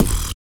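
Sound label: vox percussion, Vocal Planet Jazz FX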